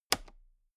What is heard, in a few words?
toolbox latch close loose regular